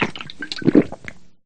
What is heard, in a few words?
drink; sfgame